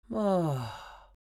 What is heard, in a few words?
Mature woman relaxed moan